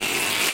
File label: tape remove